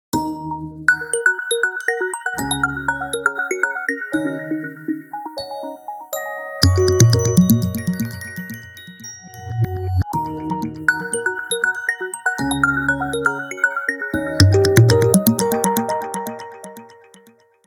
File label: Sun glittering; OnePlus 12